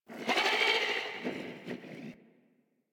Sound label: Landscape Mid Creatures, Ambience; Warframe; Venus Open World; One Offs